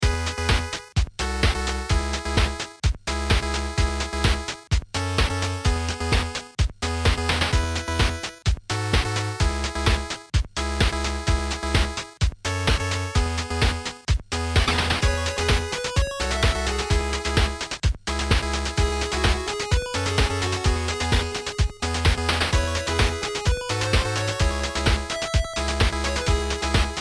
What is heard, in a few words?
beat